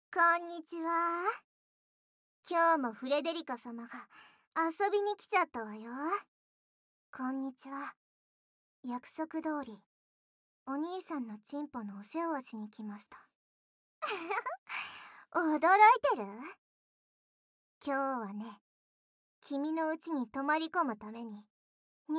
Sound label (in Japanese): 耳舐め手コキ; 小悪魔たちの耳舐め手コキ♪